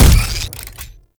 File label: Shot Fire; Warframe; Weapons; Afuris Prime